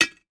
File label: food biscuit drop inside glass jar